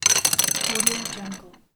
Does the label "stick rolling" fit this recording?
Yes